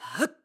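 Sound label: Lift, voice; Link; BOTW; Breath of the Wild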